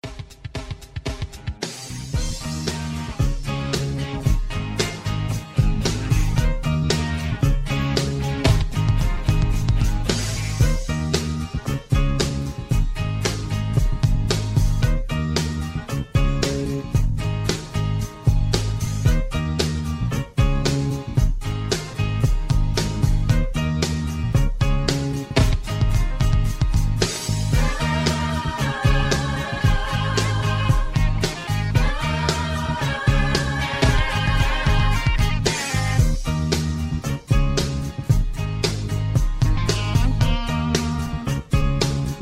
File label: High Key